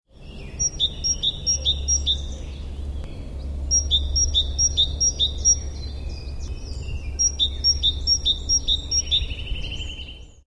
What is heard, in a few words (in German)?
kohlmeise